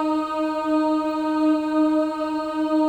OO D#4, women; Spectrasonics; Symphony of Voices; WOMEN OOS

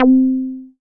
PLK OBXA C4, Oberheim OB-Xa, Synths

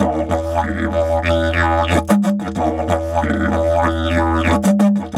Didge 93 BPM, Didgeridoo

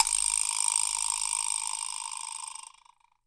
VIB LNG, Percussion; Sampleheads; NYC Percussionworks; ST PERC VOL2

